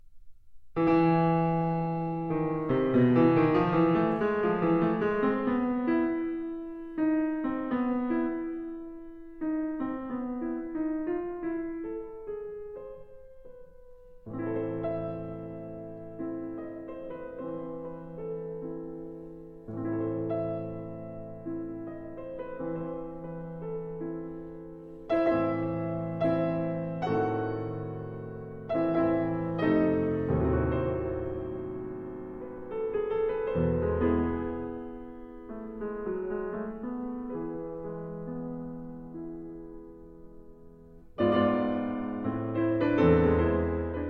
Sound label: Hungarian Rhapsody No 13 in A Minor